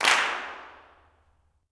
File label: CLAPS; Vocal Planet Vol1 Gospel; CLAPS+SNAP